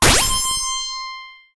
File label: Coin; Silk Worm